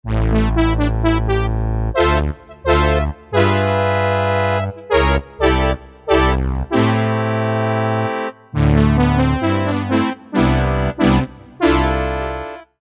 JP. BRASS, Guitar And Keyboards

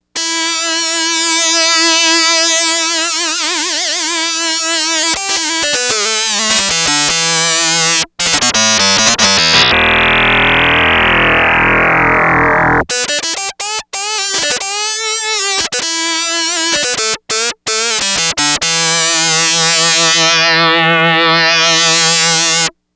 Feed Lead, Yamaha DX100; Factory Patches

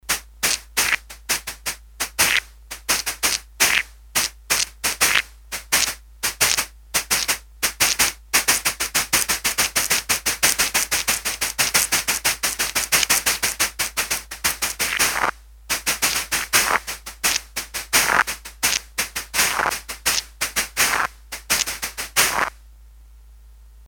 Cabasa, Korg DS-8; Factory Patches; CabasaNuvo

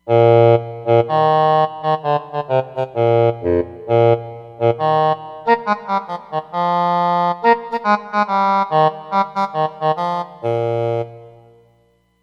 English Horn; Roland Fantom XR

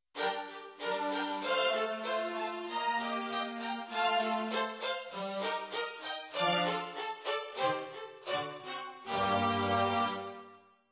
Strings

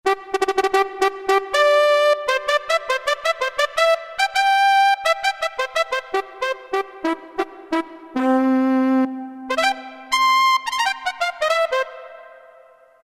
Trumpet, Roland MT-32

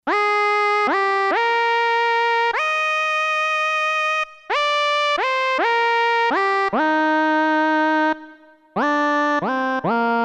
Funny Vox, Roland SC-880; Factory Tones

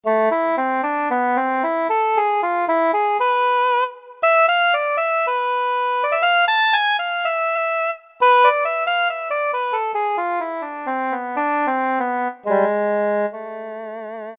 OBOE; Roland SN-U110-06

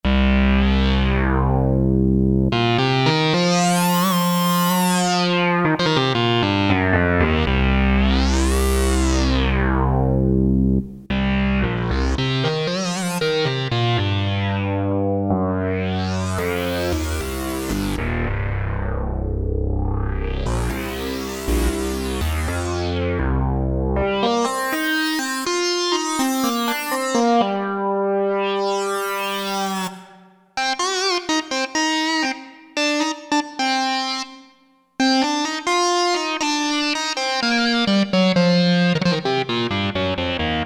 SYNC SWEEP, Roland Jupiter-8; Factory patches

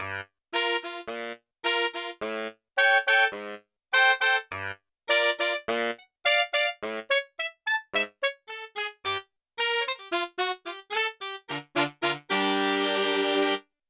Split&Layered, Four Saxes; Yamaha TX16W

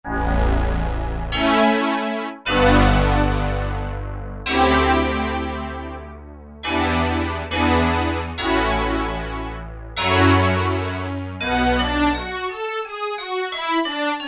Big Digital, Roland MKS-70

